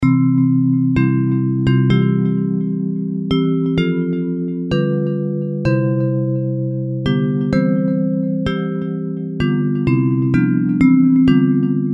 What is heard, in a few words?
Bells, Traditional hymn